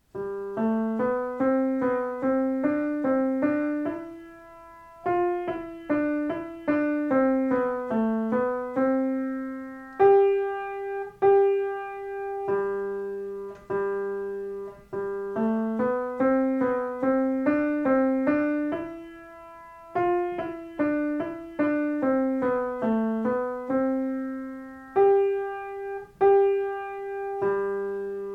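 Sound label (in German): Lied der Frösche Kanon PLAYALONG; klavierschule tastenmini band 1 notenstart; Pianodidact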